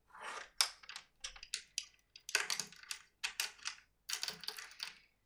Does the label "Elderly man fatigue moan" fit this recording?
No